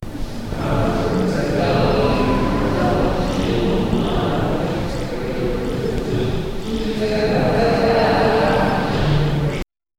background noise, gym

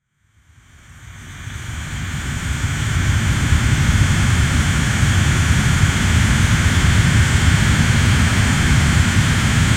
sound of an intense horri, volcano

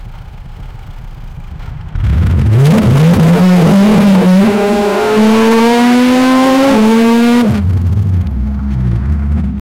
WRC rally car launch, motorsport

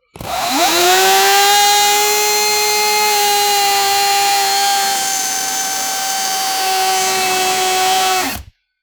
an sfx of a circular, woodworking

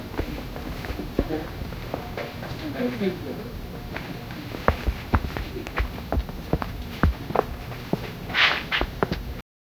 calming background noise, customer

